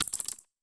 char trap

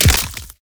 lantern soul

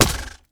remote switchblade

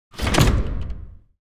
stone Gate end

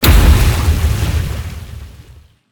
hydraulic

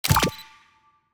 new photo

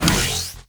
energy shot cast

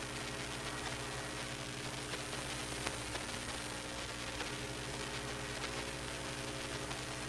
telegraph loop

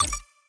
ui countdown start

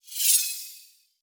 ui buffup

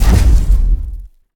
ui finish shake

